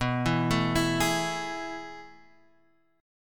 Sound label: A Major 7th Suspended 2nd